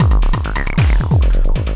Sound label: Bublz; Cloudz 1.0.3